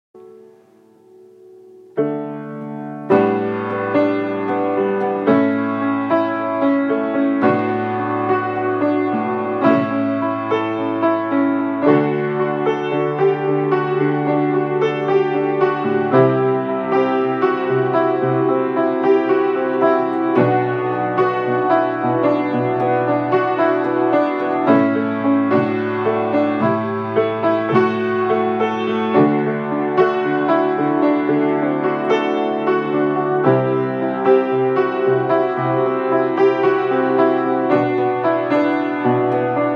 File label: piano song idea; Broadway